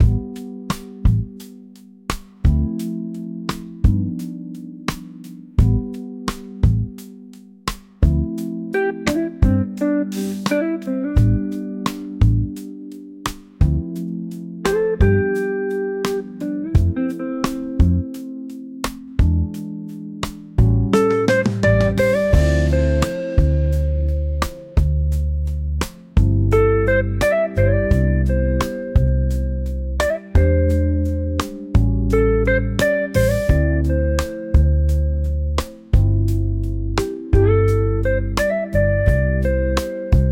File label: silent city nights loop, neo soul in a quiet room